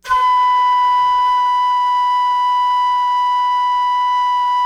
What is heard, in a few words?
FLUTE FF B5; Greytsounds; Philip Wolfe David Crozier's Saxophones